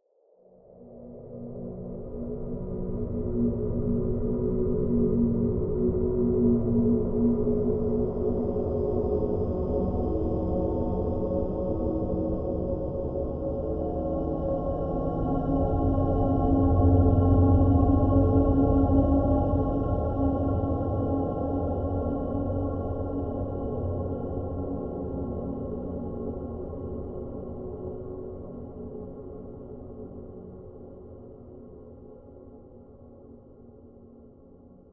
creepy dark drone

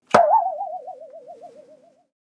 Boing

